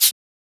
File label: Shaker; FLPRO